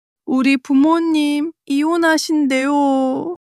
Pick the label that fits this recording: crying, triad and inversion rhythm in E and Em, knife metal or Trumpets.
crying